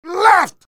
meleeing; heavy

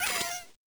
critter hit by flare; nightmare criters

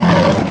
death

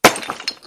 break

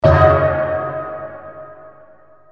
crystal; the monster plane

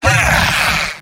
demoman negative vocalization; tf2; garrysmod; mvm

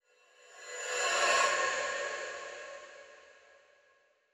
jumpscare; URANUS WAKEUP

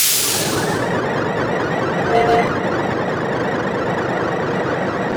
teleporter spin; tf2

